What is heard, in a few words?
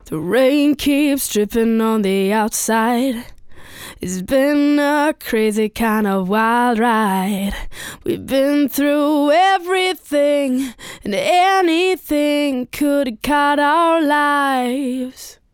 vox; antelope audio edge solo